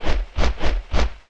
Knockback

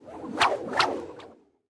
bell; Seer; GamigoNA

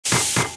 Steam Walker Run

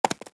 Moss Stag Run; Seer; GamigoNA

